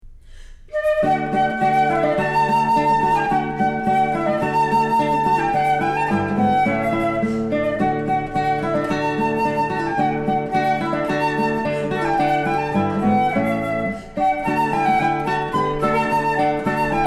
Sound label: Classical Duo; Vivaldi; Spring